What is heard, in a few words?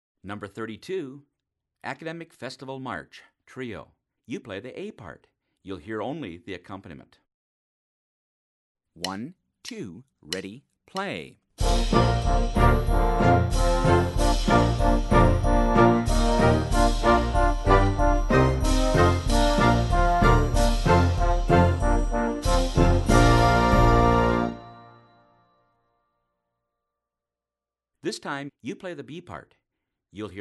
academic festival march